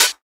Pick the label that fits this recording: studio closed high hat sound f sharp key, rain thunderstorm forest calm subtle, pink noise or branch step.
studio closed high hat sound f sharp key